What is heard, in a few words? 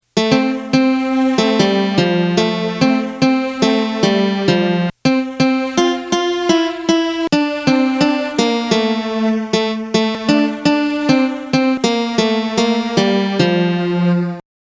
melody